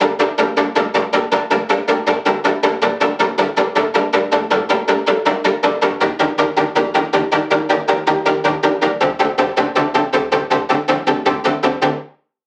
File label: Chords Rhythm Cinematic; midi wizard